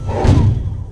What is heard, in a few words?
step; tank